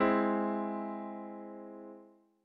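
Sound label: Ab augmented chord; ackordtabell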